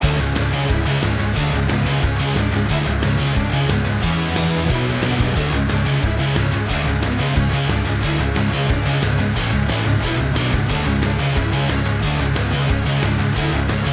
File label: Orchestral Rock Escape